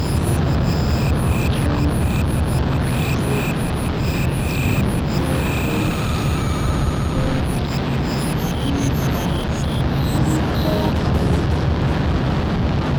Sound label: gryllus simulacrum, synth, songs of insects